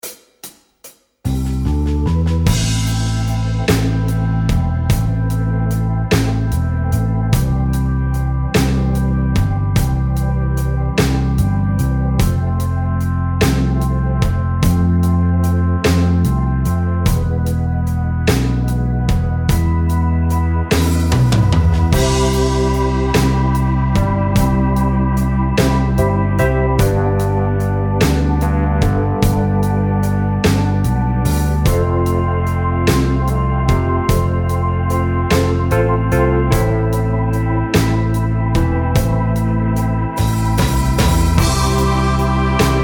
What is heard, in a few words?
soul blues